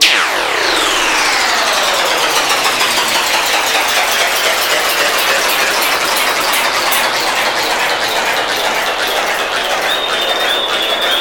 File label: Jaw Harp